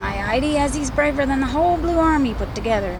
noisy

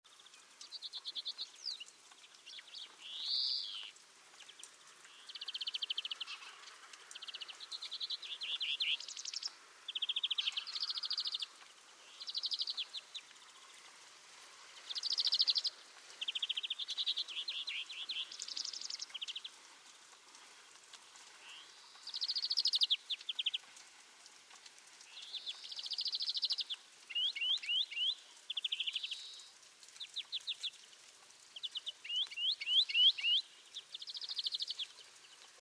Chloris chloris; SETE

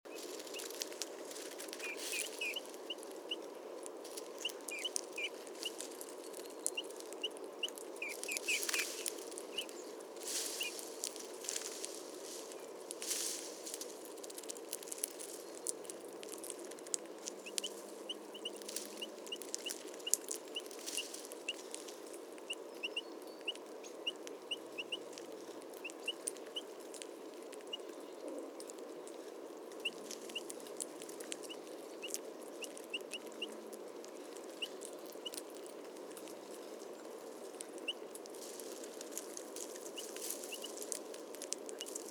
Pyrrhula pyrrhula; SETE

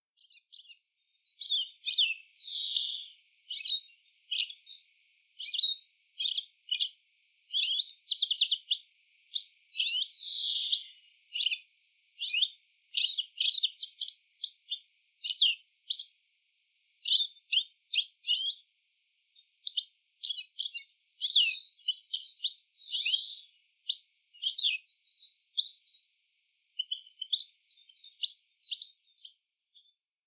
Carduelis carduelis; SETE

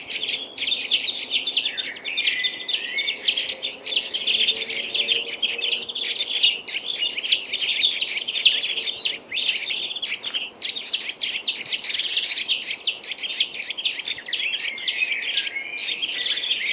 Sitta europaea; SETE

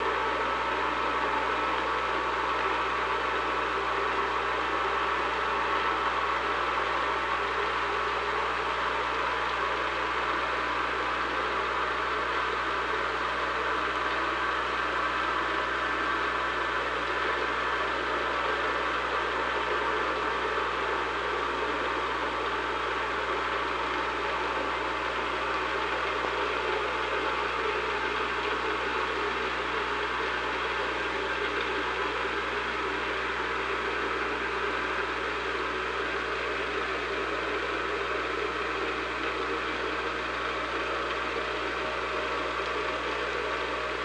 OrcaLab

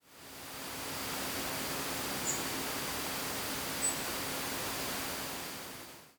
Erithacus rubecula; SETE

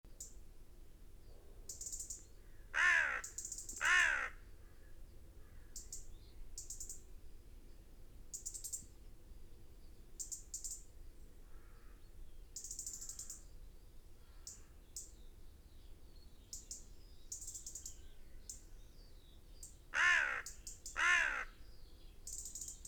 Erithacus rubecula; SETE

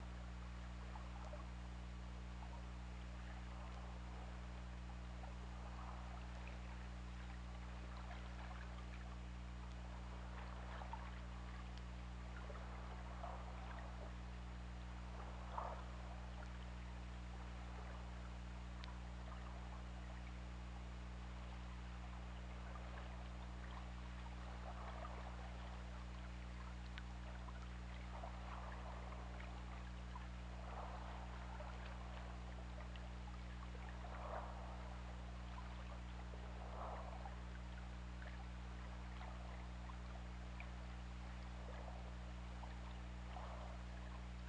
Orca; OrcaLab

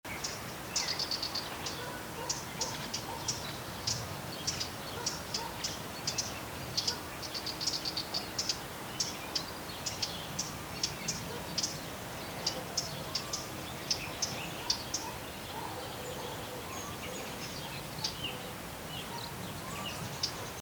Sylvia atricapilla; SETE

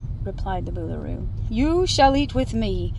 noisy

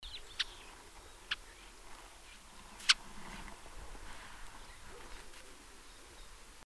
Passer domesticus; SETE

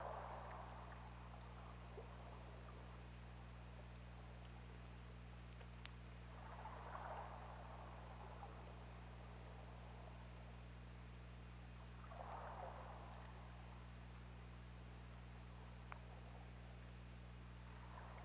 Orca; OrcaLab

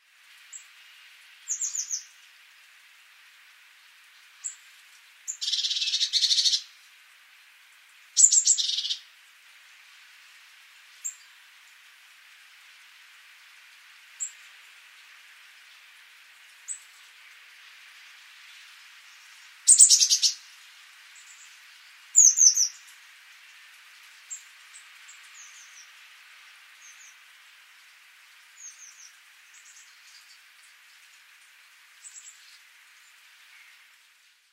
Cyanistes caeruleus; SETE

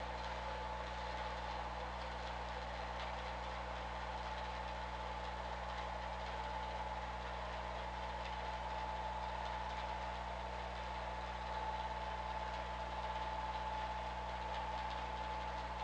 Orca; OrcaLab

